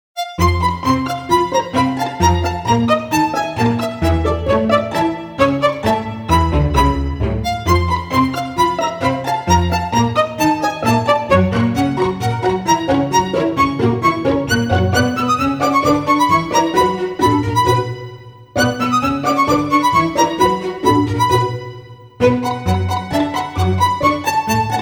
classical allusion; Itel

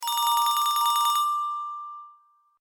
Ring

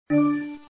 msn buddyalert; Samsung SGH-T369 Linx

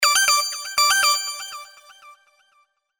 Email, Synth; HTC; Synth 1

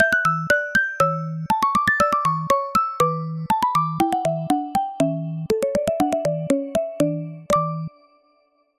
Falling Star, ringtones; Samsung Galaxy S25 Ultra

